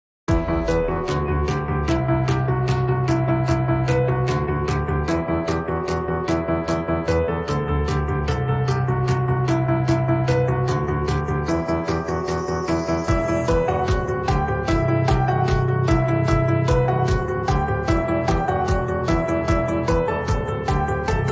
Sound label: Piano